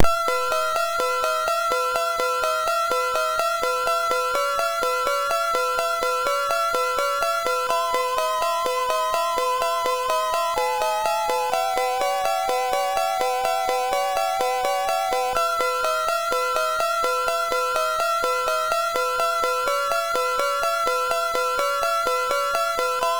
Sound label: Rainforest, MOS6581R3; sid